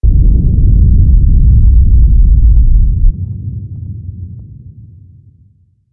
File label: big explosion